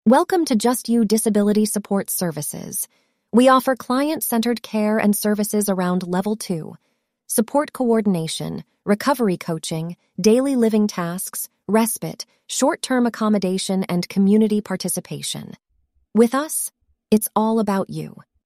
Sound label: jingle